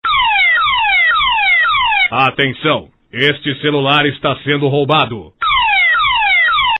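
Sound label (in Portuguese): alarme celular system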